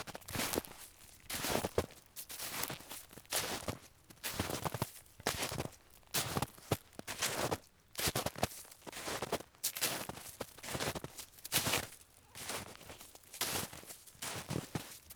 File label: Walking on Snow; SFX; IRL Recorded